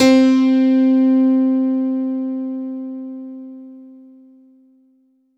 C3 DANCE, ANALOG SEQ, Roland JV 2080

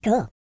E TALK, Vocal Planet R&B & Dance; ILIO; Vocal Planet VOL-4

